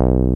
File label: 303 MUTE, BASSES; Pro-Rec - Synthsonic